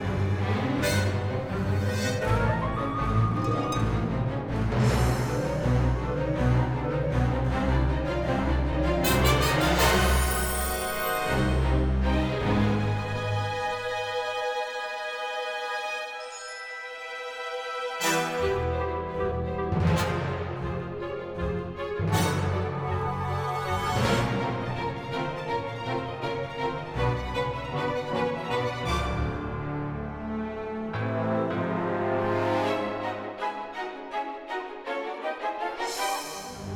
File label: Fight Music; Snd Mino Fight Music